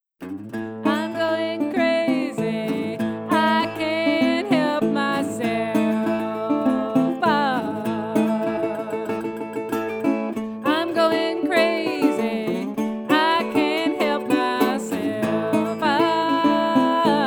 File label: Crazy Crying Blues